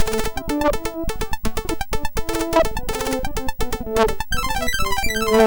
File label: Test Medieval, sid MOS6581R4; Driver 14